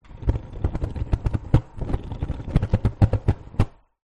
household cooker gas hob flame burn going out gas low